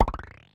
takeball; rollingballs

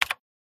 typing

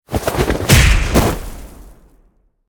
rugby tackle; battle

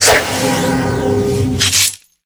shadowknife; battle

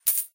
lose you; ninetynine

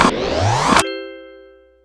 hit mark; crazyeights

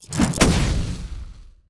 dice; game chaosbear

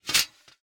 move knight, chess

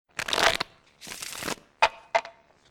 new hand; crazyeights

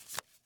draw, cards

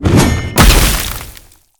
fiery war axe; battle

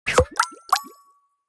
open

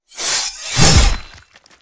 animated sword; battle

